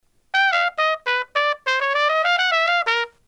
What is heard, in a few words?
lick, jazz trumpet